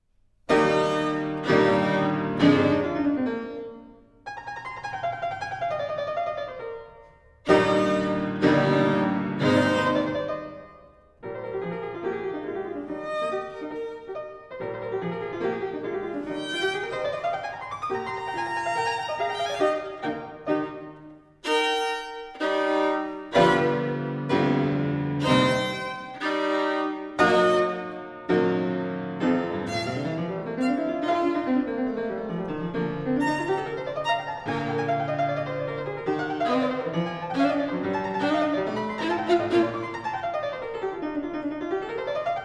Sonata for violin & piano in E flat major, Allegro; Mozart